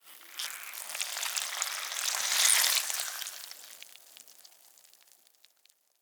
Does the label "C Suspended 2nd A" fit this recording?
No